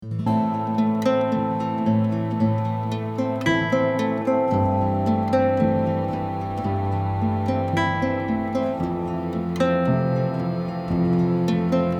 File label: guitar